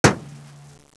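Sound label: body panel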